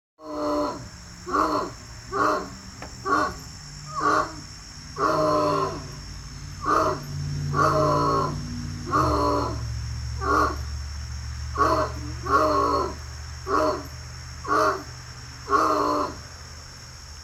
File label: Bloodhound Bark